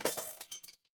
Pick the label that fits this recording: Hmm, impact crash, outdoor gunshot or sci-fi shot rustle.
impact crash